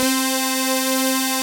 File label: BIG STAGE, synths; Pro-Rec - Synthsonic